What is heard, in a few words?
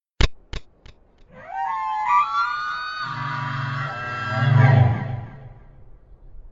yelp then growl